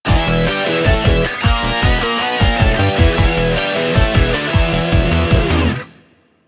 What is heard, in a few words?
Chapter Complete Jingle; Penny-Punching Princess; PS Vita